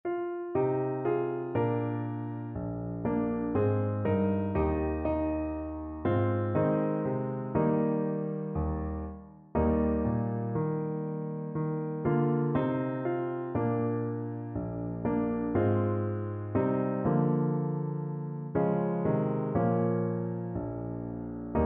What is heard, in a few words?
wexford carol TPT, trumpet